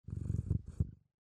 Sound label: cat purr